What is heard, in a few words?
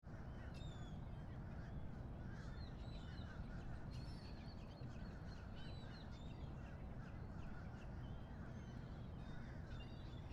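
Miami harbor 7am seagull; Skyliner; atmosphere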